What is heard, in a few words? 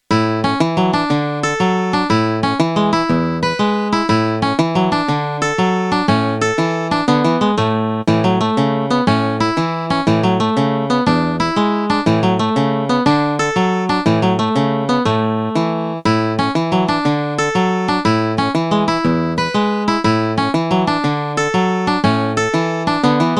Happy Guitar